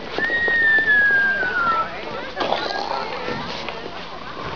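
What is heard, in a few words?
crash burn; Xander's crash and burn; The Witch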